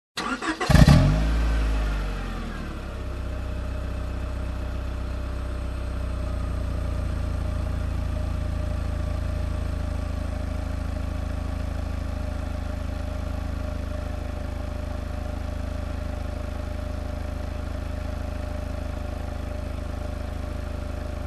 Car engine ignition and running idle

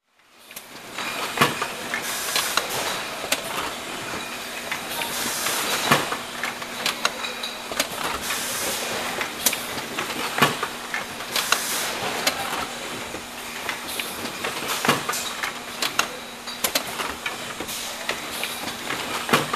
Manufacturing Machine running up-close

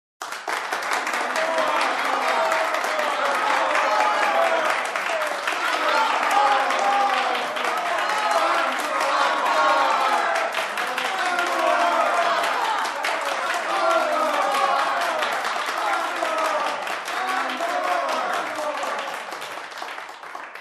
Crowd Applauding and Cheering

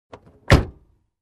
Closing Car Door

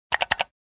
Double click mouse

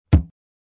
Dull hit sound effect

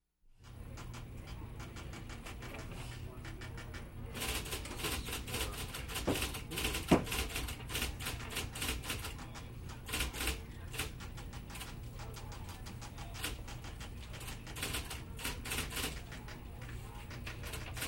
Typewriter Office Work

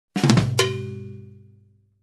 Short Tom fill

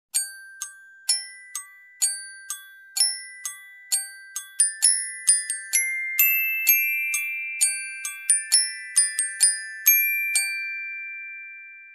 Sweet mallet lullaby melody extended

